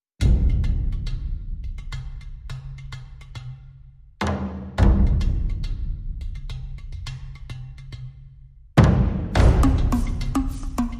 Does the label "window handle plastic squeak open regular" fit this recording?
No